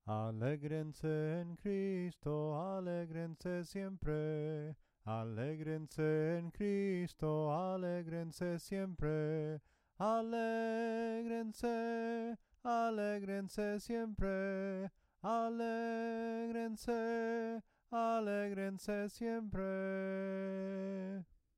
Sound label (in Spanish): SOPRANO CANTADO; ALEGRENSE EN CRISTO